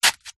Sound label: foley footstep single flip flop dirty concrete